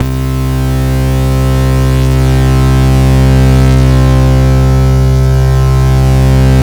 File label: ACID CUT BASS; Masterbits; Vintage Voltage